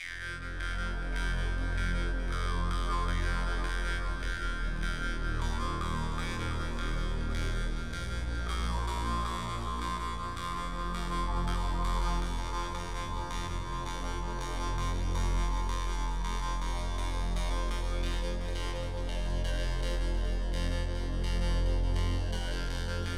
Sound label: Waxharp